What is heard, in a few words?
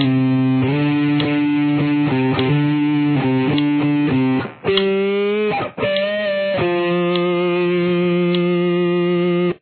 solo